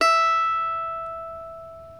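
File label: reference pitch mandolin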